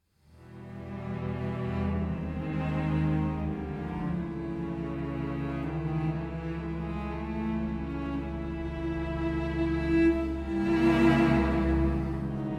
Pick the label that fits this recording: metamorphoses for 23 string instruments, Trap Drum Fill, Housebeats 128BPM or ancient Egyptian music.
metamorphoses for 23 string instruments